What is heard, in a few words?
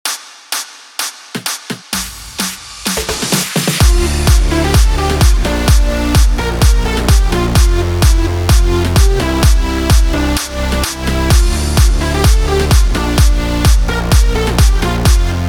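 PROGRESSIVE HOUSE D minor 128BPM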